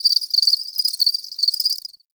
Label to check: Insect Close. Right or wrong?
Right